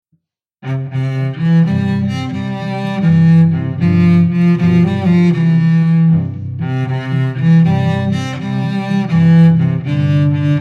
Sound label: with bass bar, violins